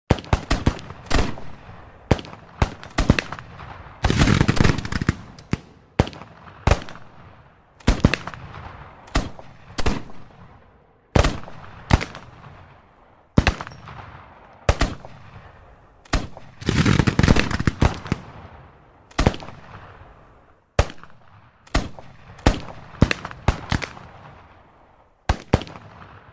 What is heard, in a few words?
War sound